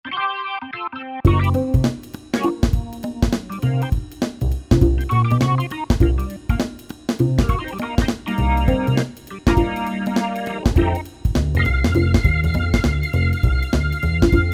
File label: Split Org